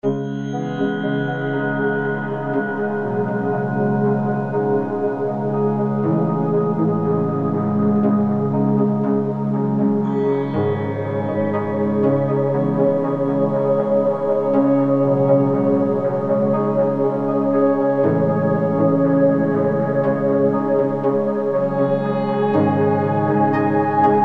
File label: A Little Serious Scape; Storytelling Essentials